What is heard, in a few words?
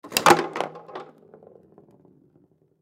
Foosball Table; Foosball Table Kick Ball Hard Roll; Sports